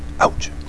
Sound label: Oouch; Darkwood 2.5